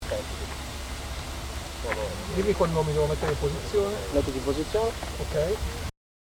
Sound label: ambient before